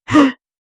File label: a sudden desperate gasp, zombie